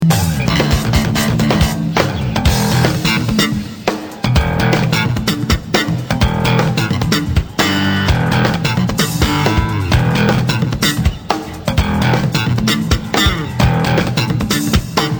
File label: bass test; sony